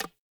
Hunter Bow Grab; Weapons; Player; Bows; Envoy Hunter Bow Ranged Melee

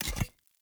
Urn Metal Pickup; Objects; Pickups

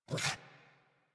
Puppy Idle Snort; Enemies; Vocals; Kubrow; Warframe

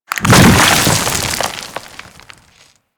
Desert Skate Burrow B; Warframe; Attacks

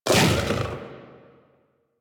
Armored Vocal Attack Short; Enemies; Warframe; Kubrow

